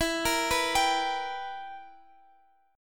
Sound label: B Major 7th Suspended 4th Sharp 5th E